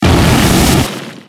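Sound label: Battle Boss skill; Etrian Odyssey III HD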